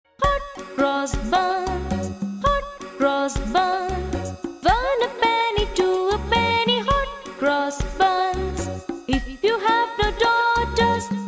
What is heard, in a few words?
hot cross buns